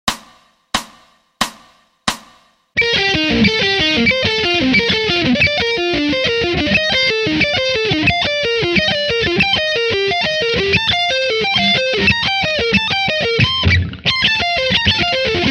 Sweep Pick Slow

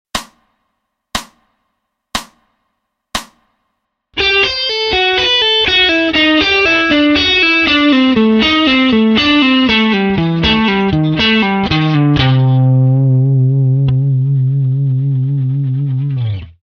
A Dorian Line (SLOW)

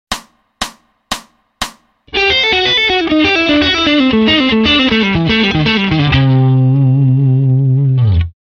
A Dorian Line fast

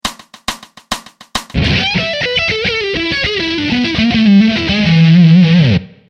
Another Bluze Lique (FAST)

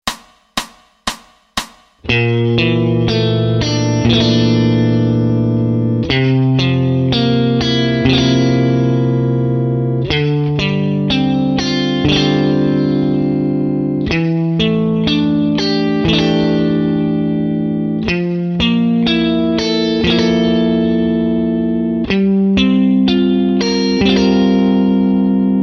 C Mixo Harm In 4ths (5th Str)

